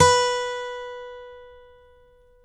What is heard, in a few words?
ACGT B4, ACCGTR PICK